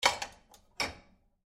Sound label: Dishwasher Bowl, Dishwasher Place Bowl In Rack; Whirlpool Appliance; Household; Appliances; Blastwave